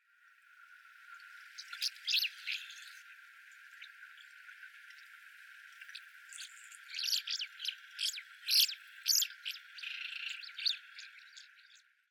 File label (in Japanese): kanmuriumisuzu, tokushima, koe